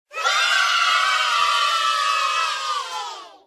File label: yay sound; grade1; math; basic subtraction